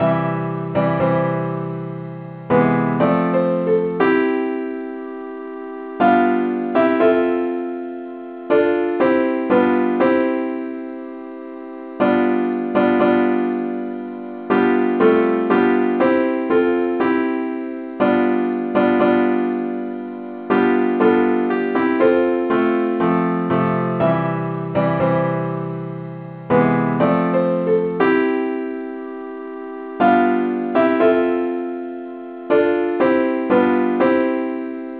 simple acc key c

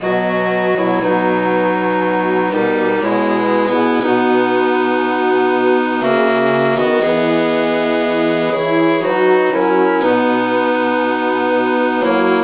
traditional key E

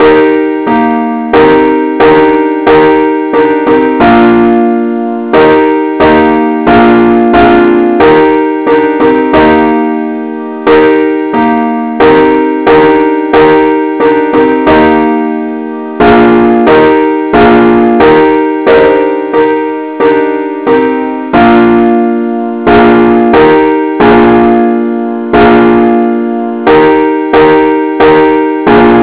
compare Chords

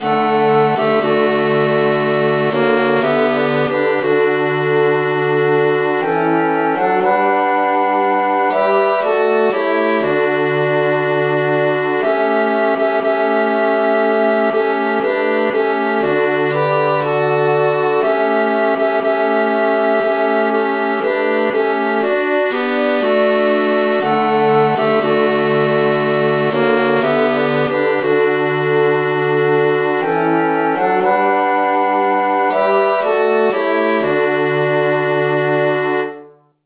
traditional key G

